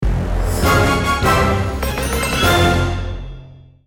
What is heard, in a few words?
Defend Win; Disney Infinity 2.0 Marvel Super Heroes